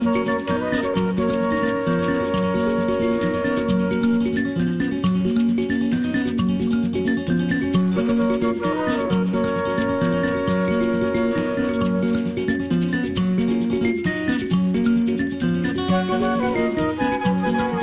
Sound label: Traditional (Luganda)